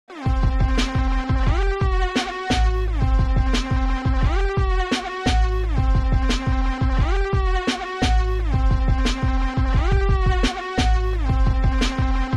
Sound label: Instrumental